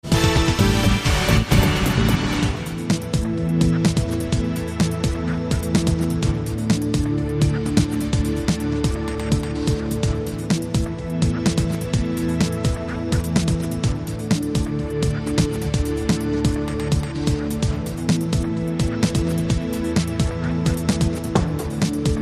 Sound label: Game Time Bed